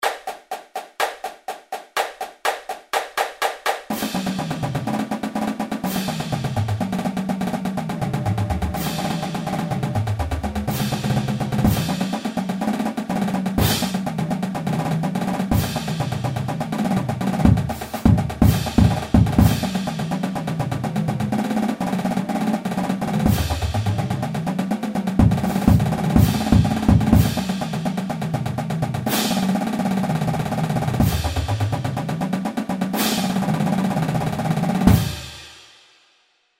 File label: 124 bpm